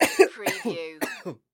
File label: Cough Male